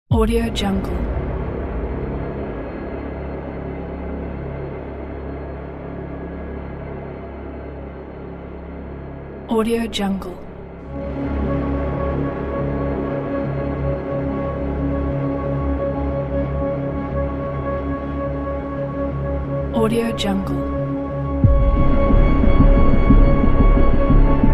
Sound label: drone suspense